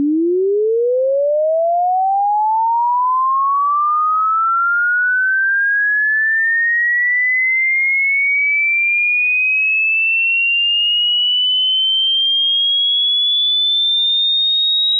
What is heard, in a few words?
freq sweep hi